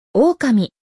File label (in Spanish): lobo; Relaciona Palabras en Ingles